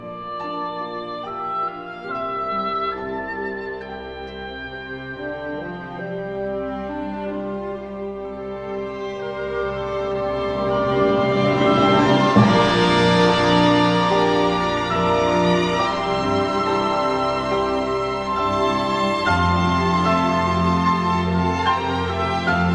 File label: March Caprice for Piano and Orchestra